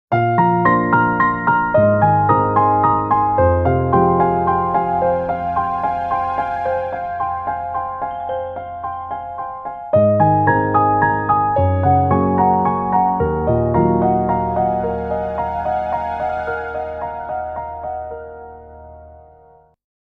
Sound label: Scooped, Nokia Tones; Windows Phone apps